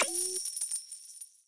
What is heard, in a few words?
count Up End; netent; neonstaxx